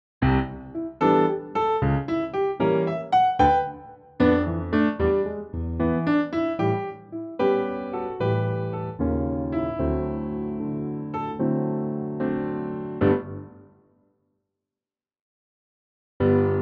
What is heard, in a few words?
piano